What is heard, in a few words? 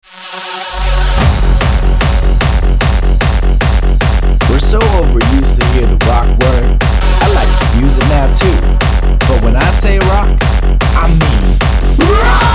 hardstyle sample